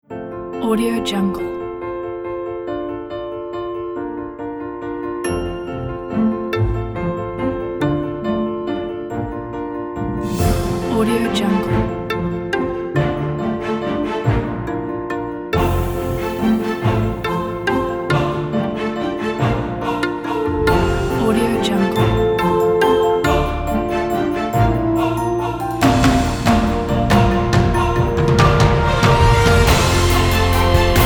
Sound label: evolution pop orchestral